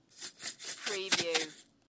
Shake coffee jar

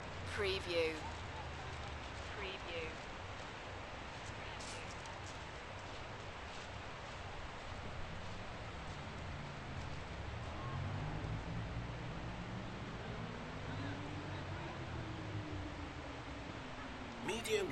Brighton beach ambience; beanstalk audio

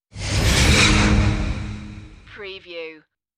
Dark Production Whoosh

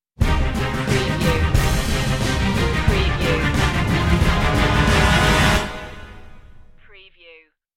sting no choir

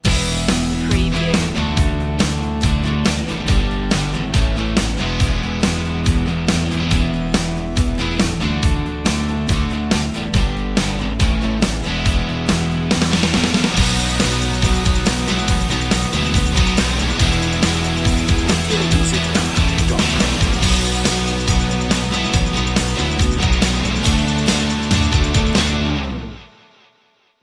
rolling free loop

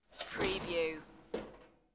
Stove Door Open

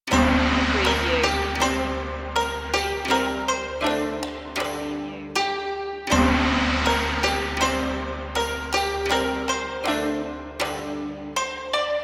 asian ceremony stringed instruments and perc only

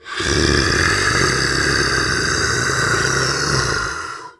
Monster Animal Groan long

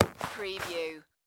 Baseball Glove Close; sound effects bible

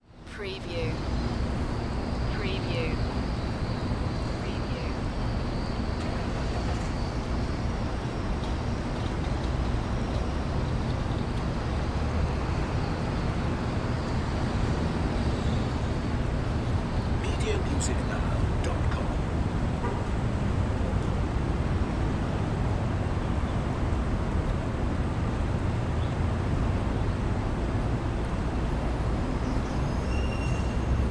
City Alley; sound effects bible

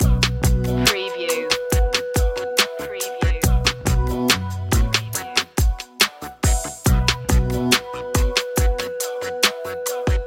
deep hip hop loop

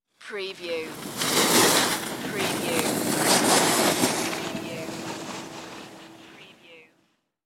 Snowboard By

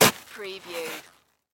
Snow Shovel Scoop

